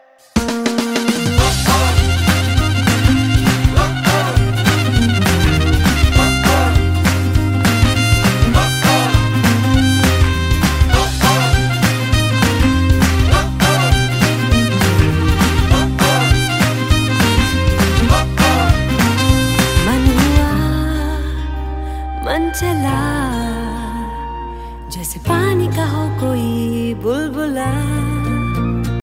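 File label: guitar music ringtone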